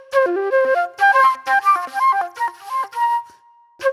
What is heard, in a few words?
Jammy Flute